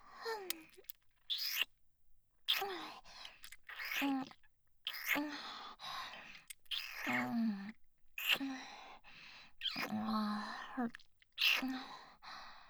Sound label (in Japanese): フェラ音